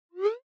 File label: Smartphone UI Sound Dry